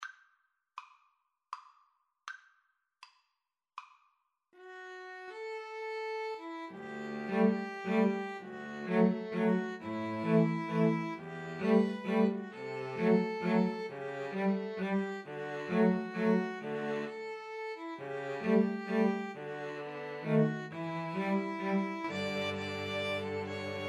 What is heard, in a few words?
waltz, String trio